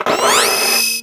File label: activate sound; octonozzle